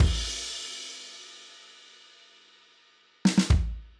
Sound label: Drums end